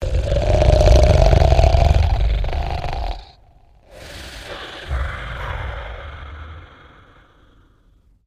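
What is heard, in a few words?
Character Giant Sleeping Snore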